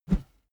whoosh bamboo slow

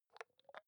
hygiene small cup open soft; haus-garten; badezimmer

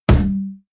can heavy hit saturated

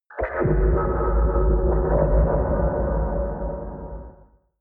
wobble scrape, drones

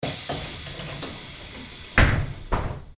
bus door closing

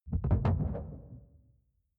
sci-fi alien wobble slow; swooshes-swishes

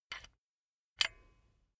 silicone gun bullet lock mechanism soft; berufe; handwerker; soundpool

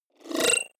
disappear flicker short, signale; soundpool

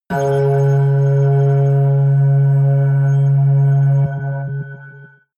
metal organ, hits impacts

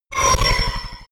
sci-fi laser shot disrupt; krieg-waffen; soundpool

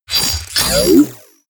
promo pad; whooshes rises

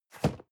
cardboard box medium place slide; objekte

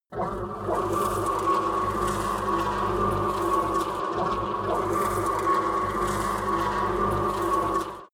sci-fi flutter; soundpool

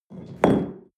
espresso upset tablecloth medium; sport-freizeit; restaurant-cafe

